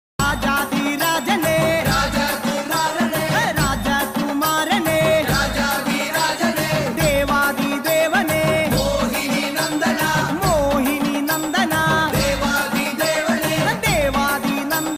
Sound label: Swami Ayyappa devotional ringtone; sabarimala